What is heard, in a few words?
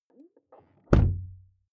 car door close interior hummer h3; Cars